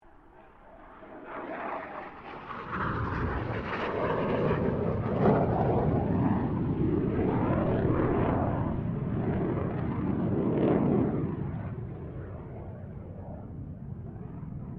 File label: 1997 BAE HAWK 127 PASS; Airplanes